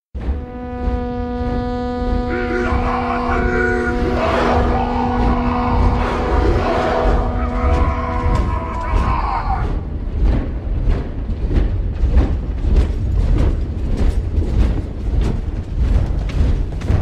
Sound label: Ancient army